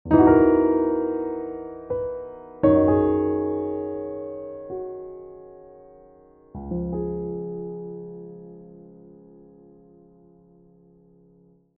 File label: Level 2 Victory; chirpy reverie online gamerip 2021